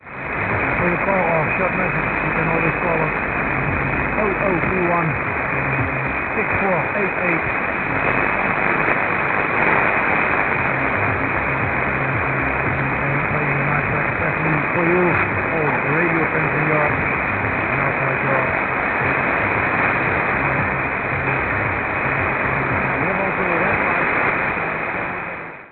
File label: 6307 kHz; Royal